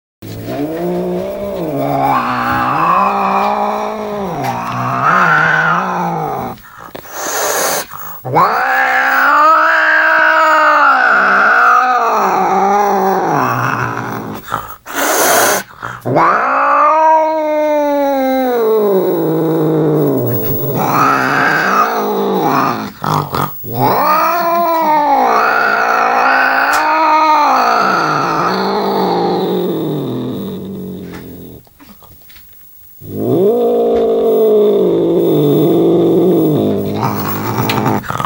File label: growling gulping choking hissing